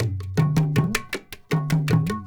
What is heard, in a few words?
INDIA R, IND ENS PH, Heart of Asia; Spectrasonics - Heart of Asia CD2